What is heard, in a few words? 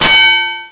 buz; Macromedia - Multimedia Showcase 5.1